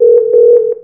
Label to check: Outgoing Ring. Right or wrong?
Right